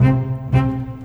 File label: Bass Cello; Sonic Foundry (Sony Creative Software); Orchestral Series VOL-4 - Rock and Pop